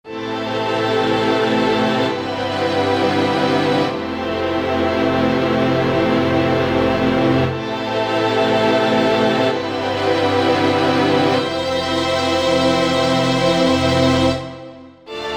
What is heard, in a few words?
Tron Strings